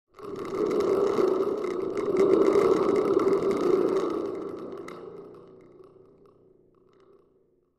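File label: Spring Drum Small Shake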